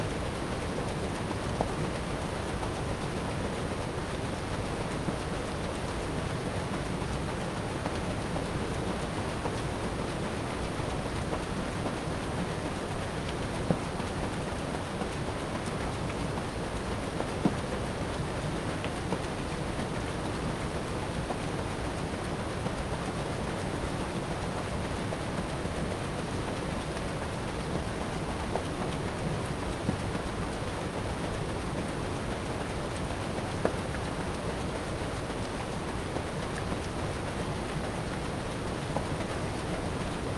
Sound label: water mill wheel outdoor mid field stereo; sonniss gdc